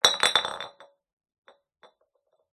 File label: pub beer bottle knock over concrete; field reports